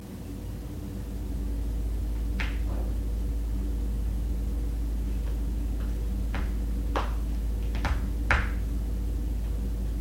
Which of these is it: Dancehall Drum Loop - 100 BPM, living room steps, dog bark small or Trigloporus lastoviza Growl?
living room steps